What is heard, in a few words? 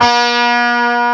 CHARANG C4; Greytsounds; Scott Peers Sound Engineering